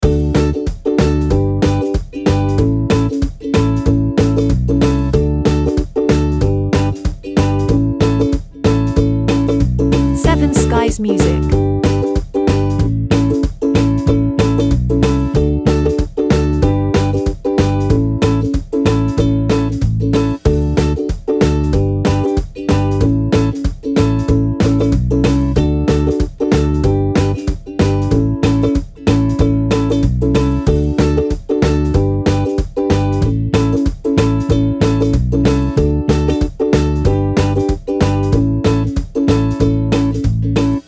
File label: Ukulele Loop